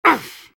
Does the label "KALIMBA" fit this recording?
No